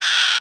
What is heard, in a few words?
pain